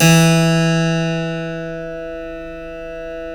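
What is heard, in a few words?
E2 clavinet left, Keyboards Acoustic; USB Soundscan vol.17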